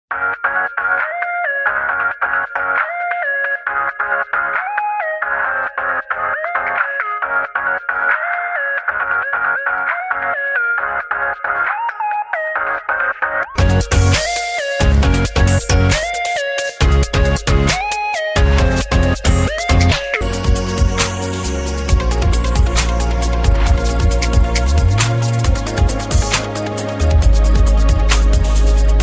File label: Awesome Tune